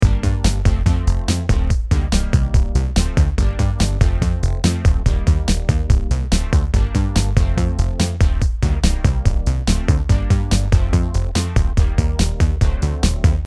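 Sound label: drums bass chords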